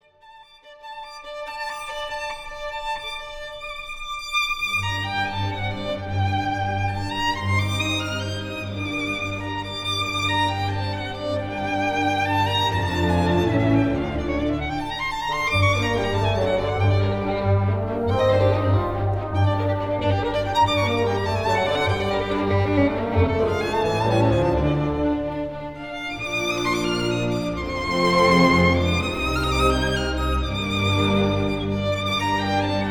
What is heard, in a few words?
Violin; Beethoven; Baltimore; Zinman; beethoven violin mvt 3